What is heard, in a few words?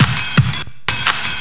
loop, Drum & Bass, 170 BPM